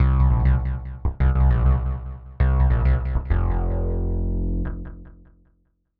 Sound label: Classic Funk Synth echo